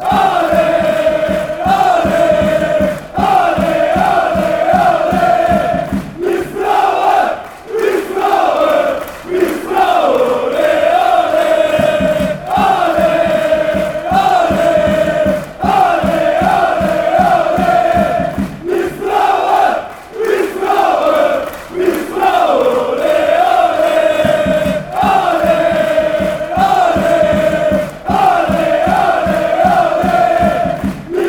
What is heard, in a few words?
allez, fan chants